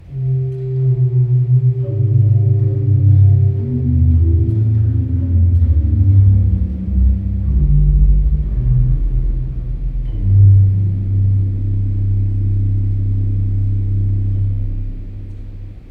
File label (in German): subbass 16, Pedal, Subbaß 16', ein tiefes, aber sanftes Register - am besten mit Kopfhörern zu hören.; kirchenmusik; pfeifen; gereon koeln